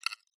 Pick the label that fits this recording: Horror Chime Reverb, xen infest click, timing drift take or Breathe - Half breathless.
xen infest click